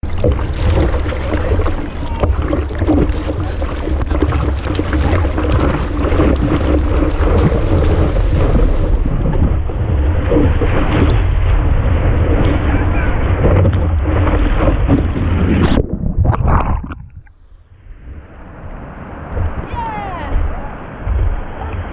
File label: gopro sound, surfing